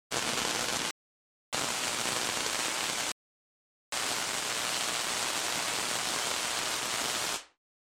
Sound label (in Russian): svarka